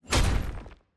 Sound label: Enemy Shields Get Hit; TANK; 3Souls